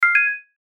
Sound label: chime high; Chimes (Sound Effects:Multimedia)